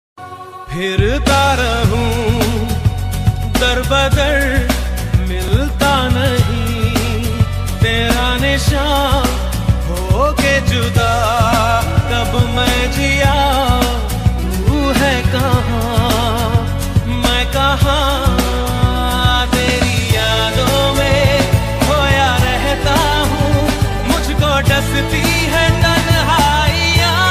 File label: song ringtone trending song ringtone sad ringtone; instagram